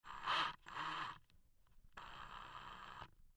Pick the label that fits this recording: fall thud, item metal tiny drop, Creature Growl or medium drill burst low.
medium drill burst low